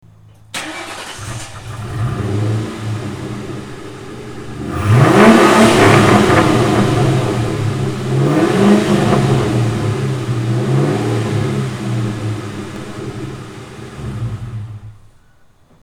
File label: mustang; bullitt; spin